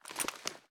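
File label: foley assault rifle akilo47 reload fast twist; weapons; viper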